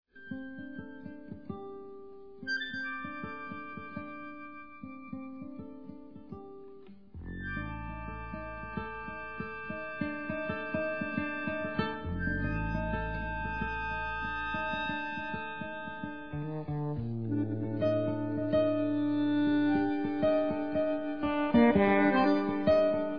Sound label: Jazz meets Tango